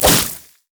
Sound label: caustic spittle; LoL; kogmaw